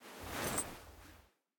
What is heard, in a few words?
armor; smite; olorun